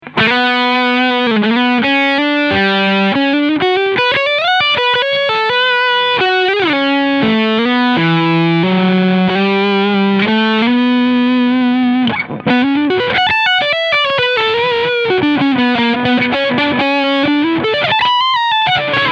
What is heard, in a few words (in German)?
PRS Hollowbody II Piezo Overdrive Steg PU